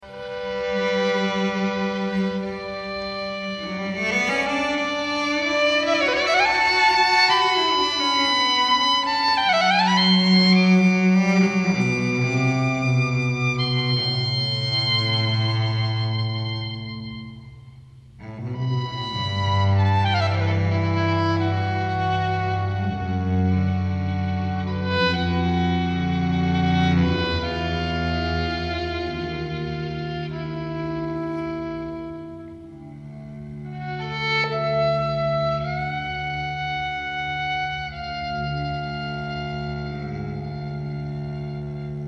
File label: 14-String